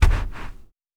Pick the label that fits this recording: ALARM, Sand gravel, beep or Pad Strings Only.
Sand gravel